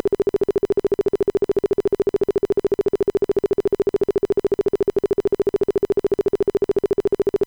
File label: tone burst